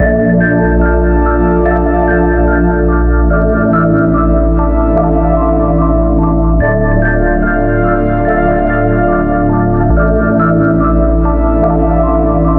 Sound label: distorto, Loops Electronic